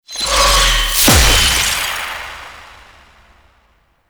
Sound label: Empowered Geode Cast; Warframe; Citrine